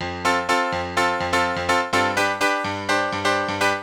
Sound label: House Piano (125BPM), retro house